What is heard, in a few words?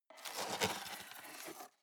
cardboard box open scrape; objekte